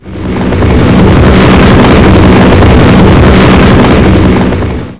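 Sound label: helicopter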